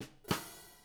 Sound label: JZ70 SWING, Living Drums